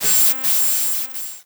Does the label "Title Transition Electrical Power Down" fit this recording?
Yes